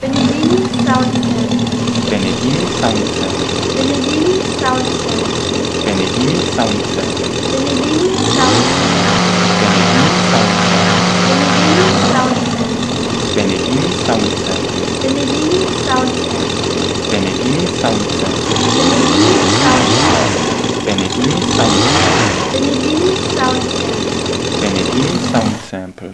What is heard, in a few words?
7 Zyl; Moki 250